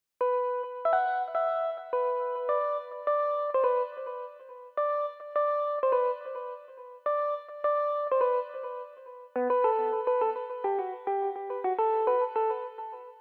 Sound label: Rnb Hip melody